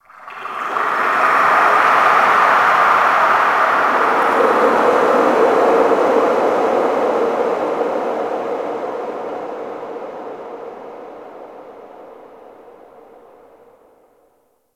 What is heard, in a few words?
Ocean Underwater Texture Medium; Warframe; Grineer Ocean